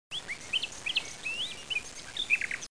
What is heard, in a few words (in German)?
Vogel